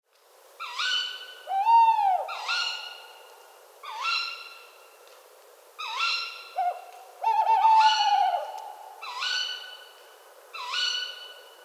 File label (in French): Chouette hulotte Couple; Citeaux parc